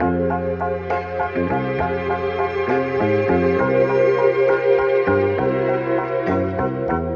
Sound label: keys and strings, jazz loops